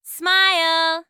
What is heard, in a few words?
voice sound smile; LG K10 T-Mobile